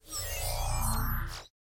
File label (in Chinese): 球员装备 战力降; 升级 装备 技能; nba